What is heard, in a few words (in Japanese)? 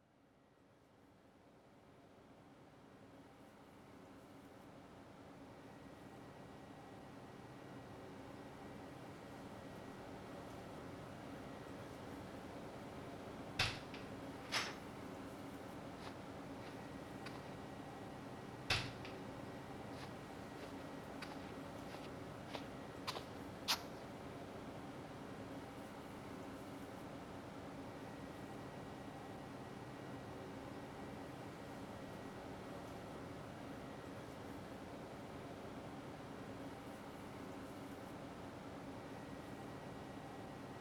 体を拭いてもらう, ASMR; ASMRver